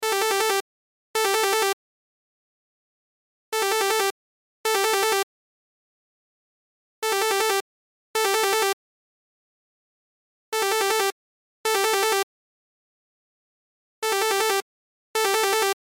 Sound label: Cell Ringtone; Loop; Cell Phones; Blastwave